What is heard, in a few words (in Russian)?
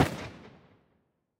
dalnij polevoj vistrel v dich